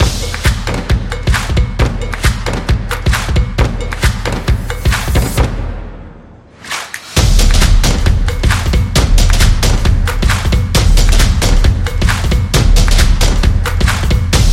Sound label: Stomps